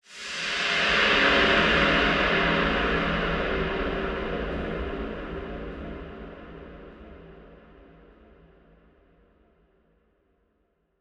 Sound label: music intro stinger; level 1-3